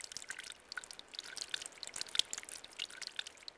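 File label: water trickle loop; water splashes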